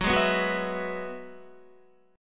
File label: F sharp 7th Suspended 2nd Suspended 4th, Guitar; F#7sus2sus4 strummed